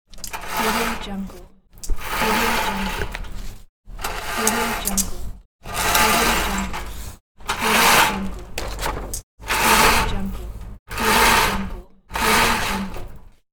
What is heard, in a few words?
8 kinds of roller blind opening sound